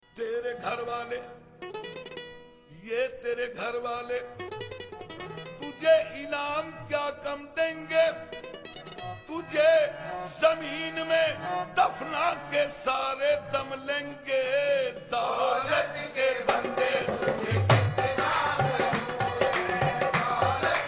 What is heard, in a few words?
Sufi